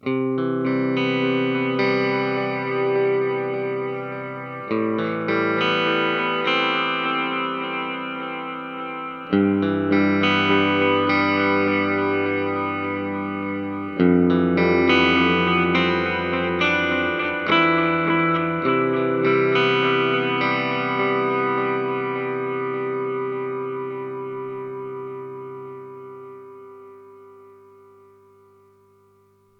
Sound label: Boss RE-202 Old Tape